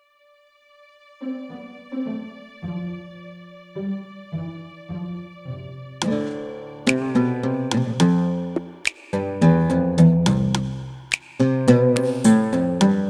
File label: Chill and Snappy, night mix